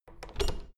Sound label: door plastic handle pull up creak